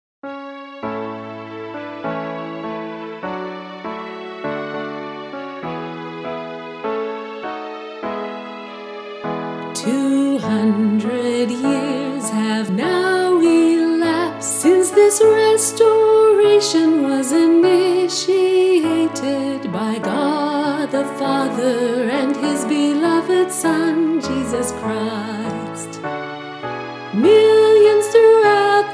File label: Vocal